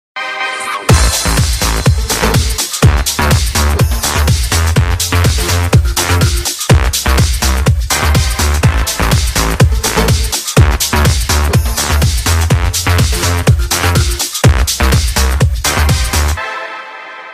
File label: nokia tone; ringtones